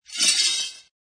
Drag Tools, Three or four metal tools being dragged a short distance on a floor; Dragging; Objects